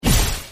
fire

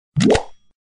fish click screen; monkeyfish

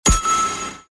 sound clock ring

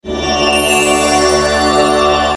SMALL BEGIN

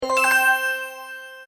UI Normal Link

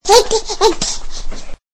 Monster Death; yule; fdfish

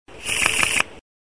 send card

